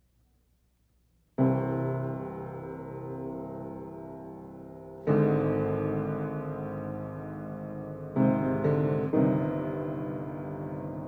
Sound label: Piano rhapsody, shorter tape noise included; dbx ON